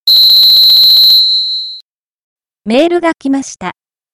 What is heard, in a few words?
ring mail